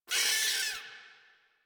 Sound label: Forest Rodent Vocal Pain; Characters; Warframe